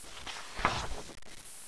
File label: page flip